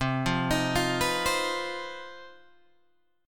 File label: C Minor Major 7th Double Flat 5th C down Guitar Open G